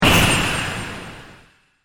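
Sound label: SE attack right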